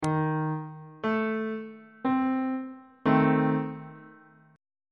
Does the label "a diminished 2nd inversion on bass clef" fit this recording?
Yes